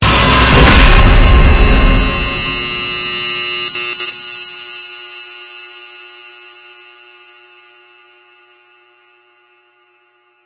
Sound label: jumpscare